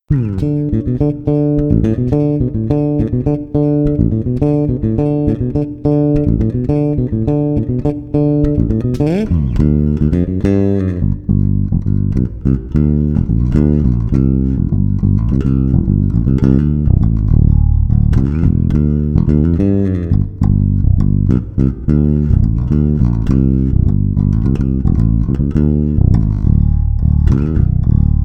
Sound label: bass only